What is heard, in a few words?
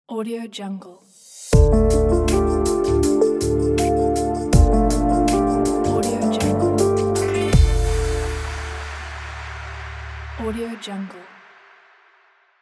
cinematic passion ident; smartsounds